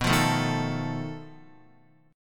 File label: B 7th Flat 5th B Guitar; B7b5 strummed; x,2,5,2,3,5; JGuitar